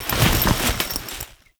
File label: Foley Kneel; Warframe; Kahl